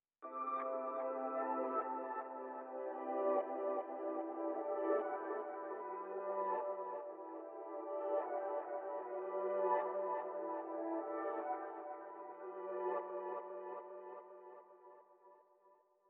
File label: 150 BPM G Maj High Piano; Reverse; Stems; Cymatics; 2022 Melody Collection; Wishing Well